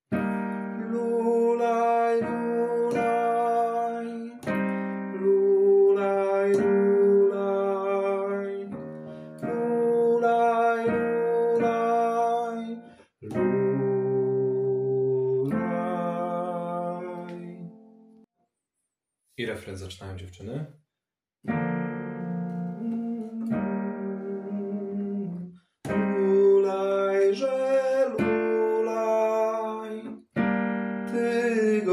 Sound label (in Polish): bass; lulajze Jezuniu; nagrania glosow warsztaty2026